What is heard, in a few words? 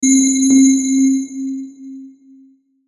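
Musical Element, Drum Snare March; Blastwave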